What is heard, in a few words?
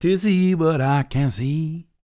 DELTA, BLUES; Blues & Soul; ILIO; Vocal Planet VOL-2